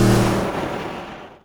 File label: car rev single; tf2; pyrocar